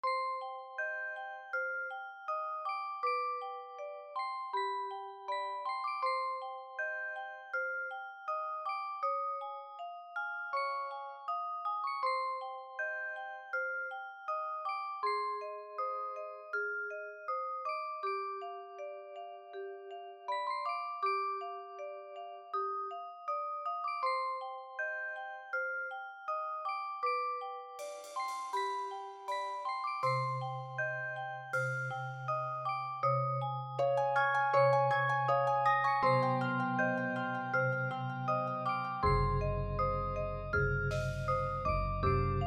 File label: The Music Box